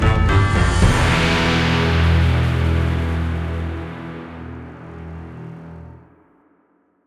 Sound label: Ending Short 225 12-8; SunEaterPhase1EndingB